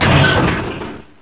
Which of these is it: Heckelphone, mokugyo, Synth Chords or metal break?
metal break